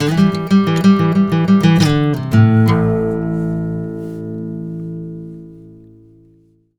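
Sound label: baritone lick A, Blues Guitar; ILIO; Fretworks; 120 BARI LICK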